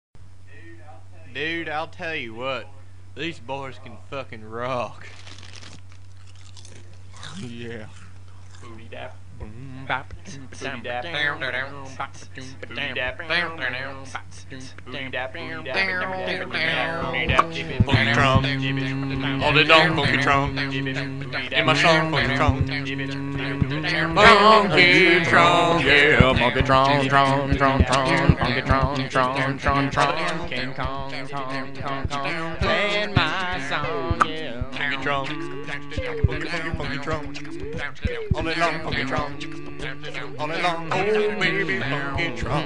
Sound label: Funky Tron